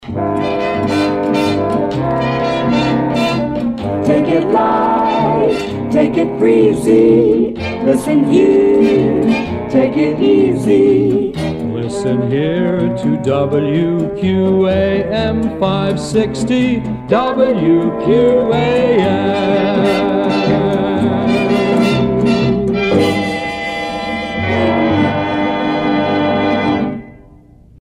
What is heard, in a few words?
Take It Light Bright Easy; WQAM; JingleMill